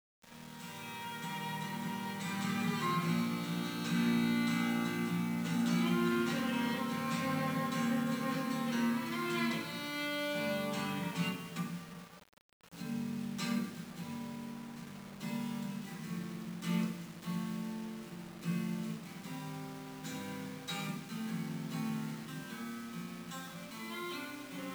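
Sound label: background music karaoke; usen; yakuza ryu ga gotoku kiwami 2 pc gamerip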